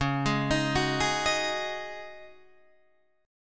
C sharp Suspended 2nd Suspended 4th C sharp Guitar Open D, chord; 11,11,13,12,11,13